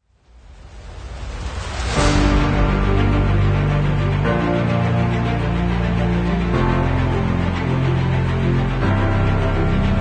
Epicness Middle Version

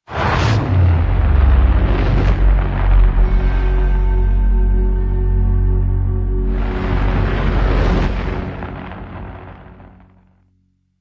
Eternal Flame Logo Full No Guitar

